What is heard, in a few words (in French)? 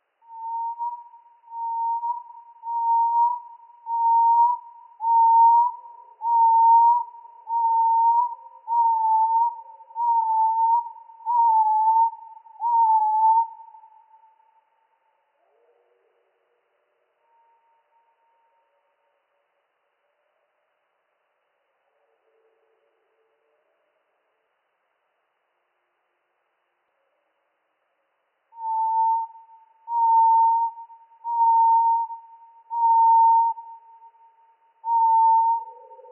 ROITELET; TRIPLE MOINS TROIS OCTAVES